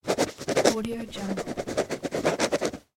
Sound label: signing with pencil on paper